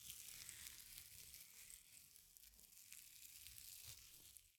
Insect Ants; Natural Variety; Varazuvi

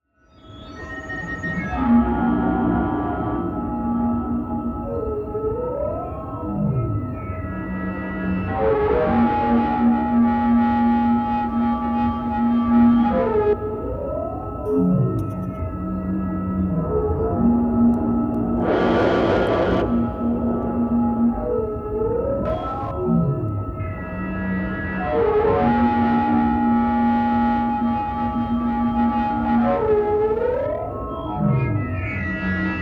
Drone (C#); Effective Trailer Drones

